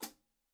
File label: hi hat 14 inch pedal; soundscrate